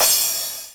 CY TR 909; DRUMMACHINES

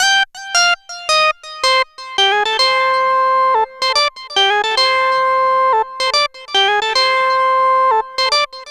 Funk Line; Dr. Fink's Funk Factory

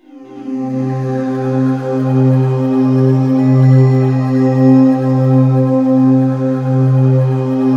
C3, STRING; Q Up Arts; Dream Experience

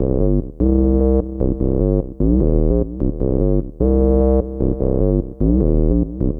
Acid Bass; Sonic Foundry (Sony Creative Software); Knutrix Drum & Bass

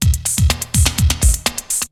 LOOPS, TECHNO; Syntec; Wall of Sounds; TECHNO-BOX

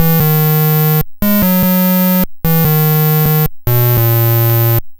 Apple II Bass, Chiptune; 8-Bit; Sonic Foundry; Sony Creative Software

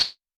HH, Vermona Mono15 Drums; Stereo layers; Swordfight

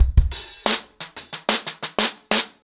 90 BPM, acoustic drums, drumloops

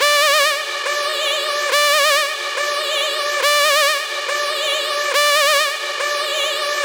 140BPM D; Vengeance - Essential Clubsounds Vol.4

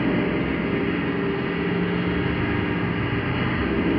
sparse soundscape samples; ReLooped

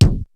XR-10 K+SN+H, drums; Rubber Chicken Software Sample Bank; DRUMS + PERC

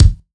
BD, Ragga & Dub Reggae; USB Soundscan vol.27; AKAI

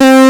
SQUARE LEED; FUNK

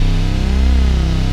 TECHNO; Pro-Rec - Synthsonic; Z1 TECHNO

